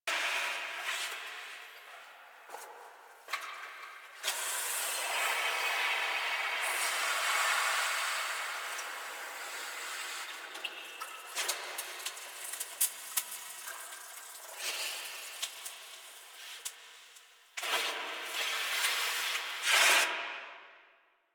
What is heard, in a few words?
Lair Chatter Loop Large; Warframe; Ambience; Sentient